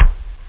dry kik, perc, kick; OctaMED SoundStudio V1; jazzies samples

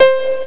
piano solo; Amiga-CD 1997 #7-8; algomusic; melody

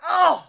plyr ht; quake; halo; hurt

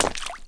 Wet Land, Footsteps; urbanchaos

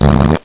Squash; GoJoe PoGo

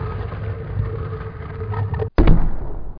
scrap d

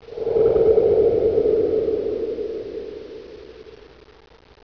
Creepy; WARLOCKSNDS

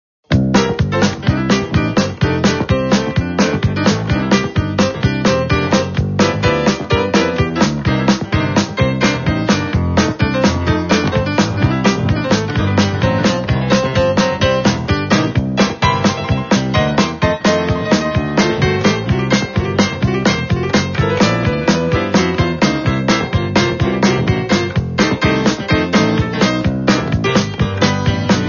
back Sound; jumping rope

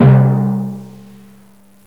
timpani; GMTones; Strings & orchestra

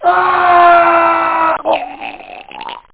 Aarrgh; Ultimatum

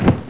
Catch; Bible Fun Pack; Education Publishing Concepts

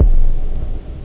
KICK; OctaMED SoundStudio V1; drums techno

